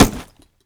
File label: plant